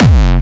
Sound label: Hardcore